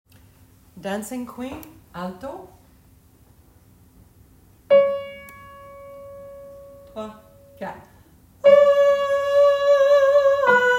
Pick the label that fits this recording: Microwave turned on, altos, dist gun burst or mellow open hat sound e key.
altos